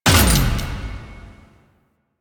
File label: Challenge Complete; Veilbreaker; Kahl